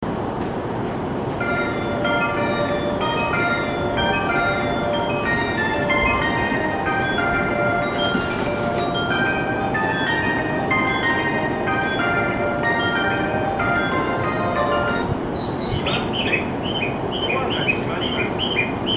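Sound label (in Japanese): usami hassya, departure melody; 発車メロディー; １番線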